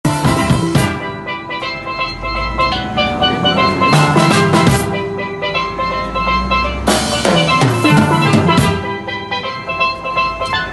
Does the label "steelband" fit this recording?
Yes